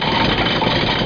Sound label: Portcullis; IQ2 Demo